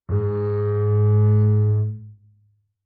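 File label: Bass arco fortissimo sul E B2, Double Bass; MIS Pitches - 2014; Strings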